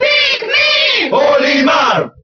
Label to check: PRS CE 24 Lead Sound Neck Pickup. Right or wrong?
Wrong